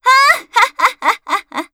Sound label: comboburst